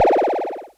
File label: Wibble, Synth FX, cartoon